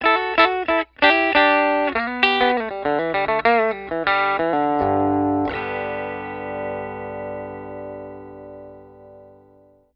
Bridge pickup through a 1950 Fender Deluxe, humbucker, lick; patent denied humbucker pickup